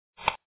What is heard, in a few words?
step